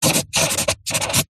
office felt tip pen paper hollow table write signature autograph; zapsplat